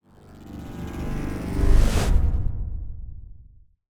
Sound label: electricity hum exit; SoundsCrate